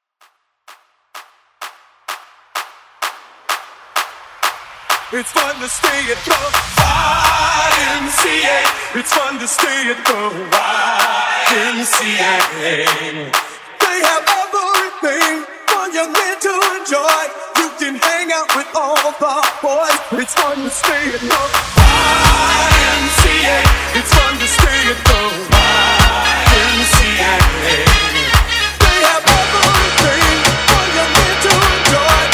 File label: Clap Acapella Starter Afro